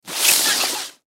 Latex Gloves Box Push Away Slide Scrape; Surgeon Surgery Doctor Nurse Physician; Hospital; Emergency

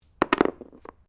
Dice Small Game Board Throw; Variety of dice sounds; Casinos

